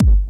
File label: hard bass drumz